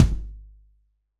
Rm Kick; Roland BK-5

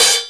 Procussion Hat; Proteus 2000; Digital Sound Factory Proteus 2000

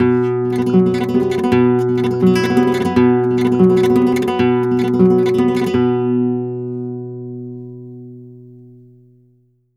Flamenco, Guitar, Tremolos, 171, b

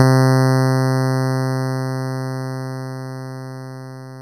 RY30 Digital; Drums; Yamaha RY30

